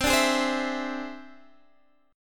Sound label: E Augmented 7th C